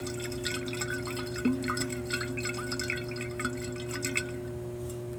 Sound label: water bottle